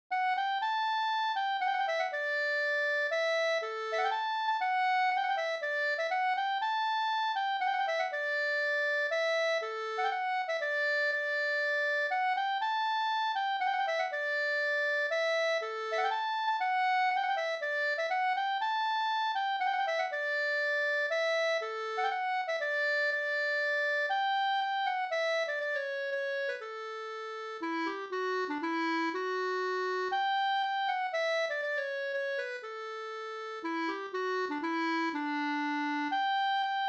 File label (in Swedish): polska Knapp-polska